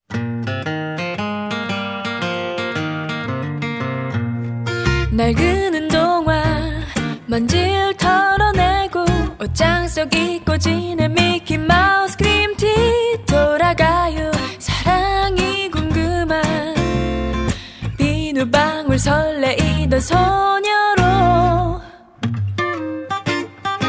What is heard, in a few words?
HAPPY BUBBLE